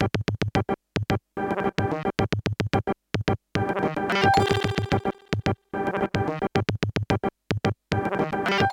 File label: psr 37 beat; Beat Patch 2